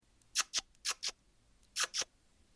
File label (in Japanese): スズメの鳴き声